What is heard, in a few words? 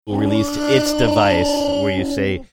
growling like chewbacca